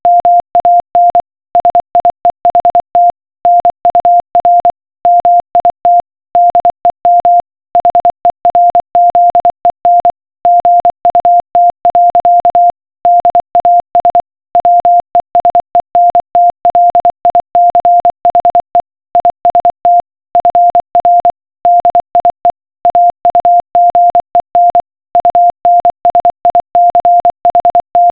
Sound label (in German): Morsecode; Prinz